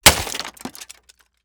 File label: Impact Plastic Smash